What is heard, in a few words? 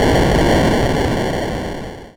door Open